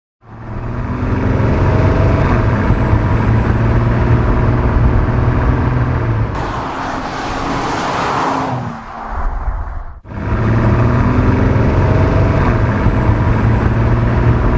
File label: Bentley Continental GT